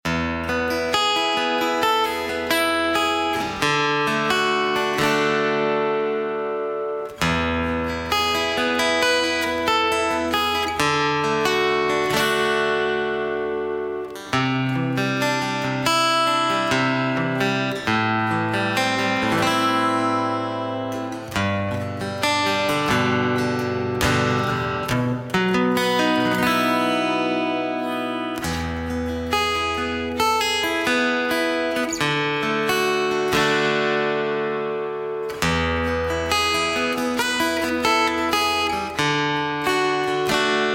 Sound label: Guitar Relaxing